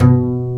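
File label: String, contrabass pizzicato; String Master Series; Cb Pizzicato; Cb Pizz 3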